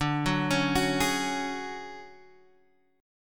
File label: D Major Sharp 11th D Guitar Half Step Down, chord